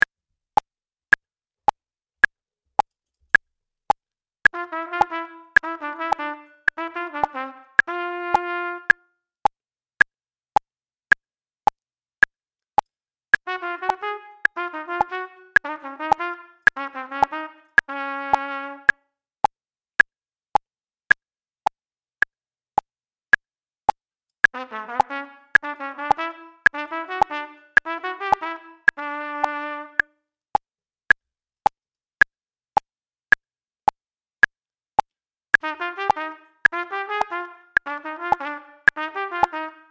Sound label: Level faster, trumpet; Double Tonguing